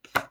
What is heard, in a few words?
card place